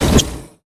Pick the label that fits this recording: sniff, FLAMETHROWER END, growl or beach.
FLAMETHROWER END